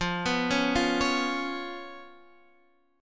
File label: B Suspended 2nd Flat 5th F